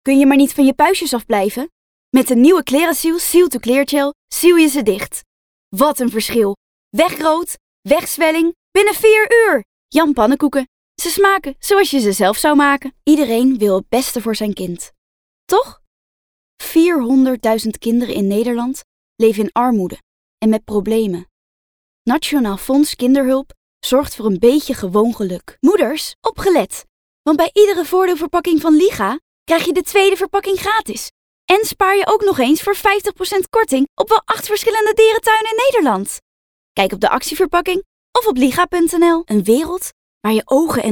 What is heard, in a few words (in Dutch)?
Stemdemo